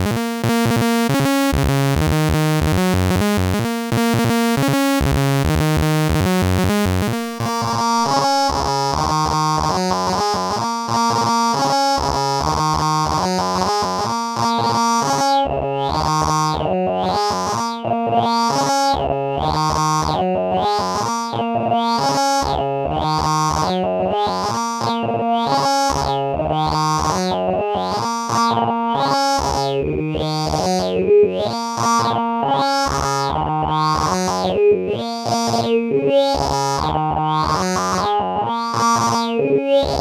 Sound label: resonator; z dsp; modular demos